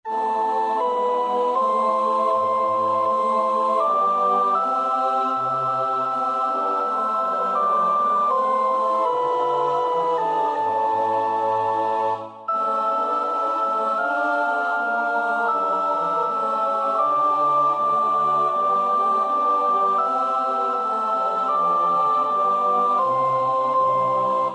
Chorale sopraan; Bach (Johann Sebastiaan); 6 Ach wie flüchtig, ach wie nichtig

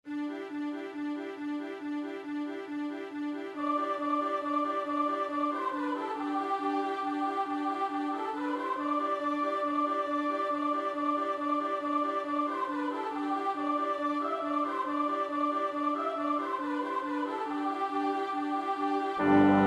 bas 2, koorpartij oefening; Orff (Carl); Carnina Burana

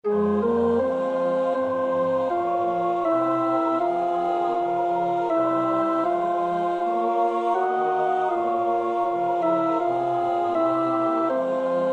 Choral alt; Aus Tiefer Not Schrei ich zu dir (Op.23.1); 1 Choral Aus tiefer Noth schrei ich zu dir

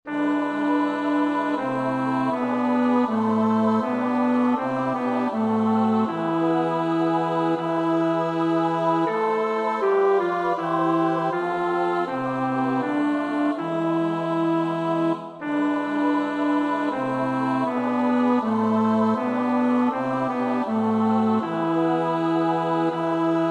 bas; Bach (Johann Sebastiaan); BWV 038; 6 Ob bei uns ist der Sünden viel

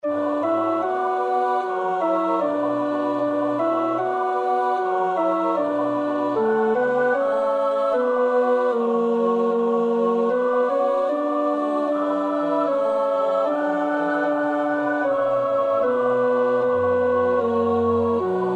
tenor; Bach (Johann Sebastiaan)

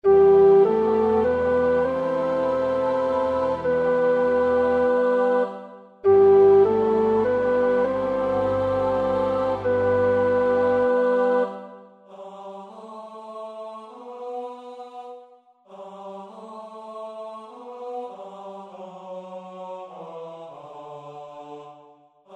tenor; Pärt (Arvo); Berliner Messe

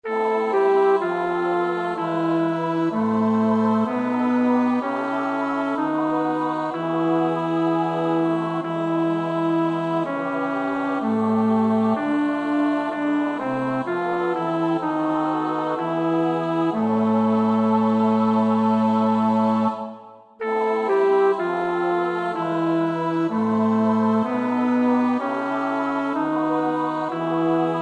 bas, koorpartij; Bach (Johann Sebastiaan); BWV 179; Ich armer Mensch, ich armer Sünder

